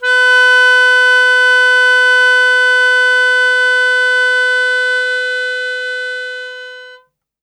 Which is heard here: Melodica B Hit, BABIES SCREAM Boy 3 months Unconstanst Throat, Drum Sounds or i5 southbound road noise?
Melodica B Hit